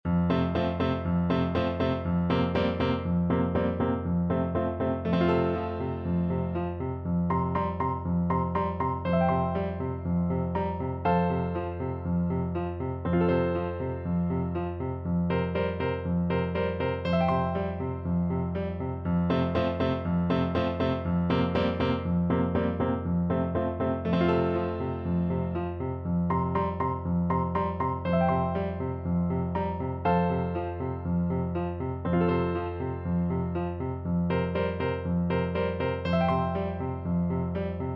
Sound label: Clarinet